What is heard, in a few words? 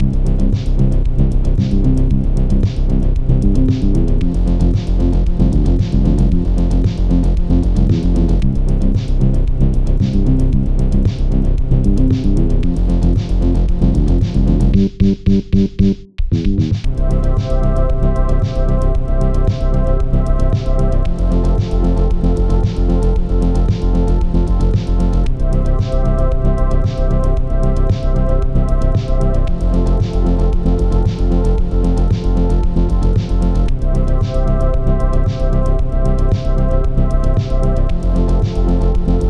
synthwave house